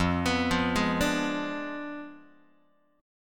F Diminished 7th F down Guitar; Fdim7 arpeggiated; JGuitar

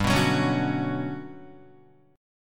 G Major Sharp 11th, Guitar; strummed; JGuitar

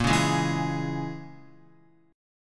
Bb Minor 7th Flat 5th, Guitar; Bbm7b5 strummed

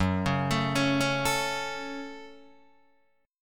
F sharp Minor F sharp, Guitar Open D

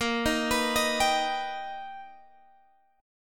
B Augmented Major 7th A sharp, chord

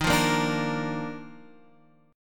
Eb Major 7th Suspended 2nd; strummed; chord

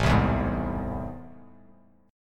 A Minor Major 7th A down, Bass; strummed; AmM7; Bass Standard; JGuitar

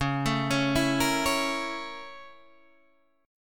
Bb Minor Major 7th Flat 5th, Db

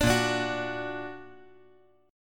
Bb Augmented Bb; strummed; JGuitar

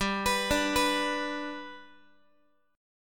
G Major down Guitar; arpeggiated

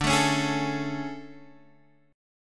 A Major 7th D

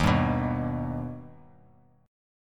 D sharp Augmented D sharp, Bass Standard; strummed; x,6,5,4; JGuitar

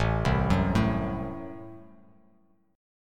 A Minor 6th A, Chord; Am6 arpeggiated; JGuitar

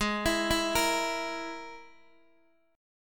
Ab Suspended 2nd Sharp 5th Ab down Guitar DADGAD; arpeggiated; JGuitar

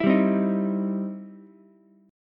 E Minor Major 7th Sharp 5th, E, down, Ukulele; EmM7#5 strummed

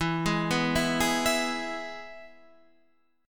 E-Major E, Guitar Standard; arpeggiated; JGuitar

